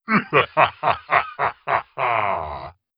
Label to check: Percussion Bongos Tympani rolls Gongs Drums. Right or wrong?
Wrong